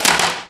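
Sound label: physics clipboard impact small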